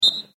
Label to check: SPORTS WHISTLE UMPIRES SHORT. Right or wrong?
Right